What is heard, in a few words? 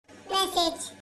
Cartoon Message